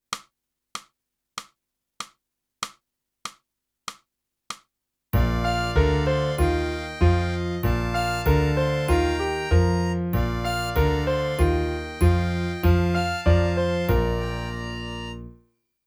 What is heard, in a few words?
clarinet; boosey method